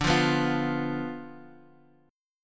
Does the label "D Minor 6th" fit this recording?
Yes